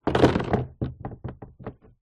household plastic bucket empty knock over on wood floor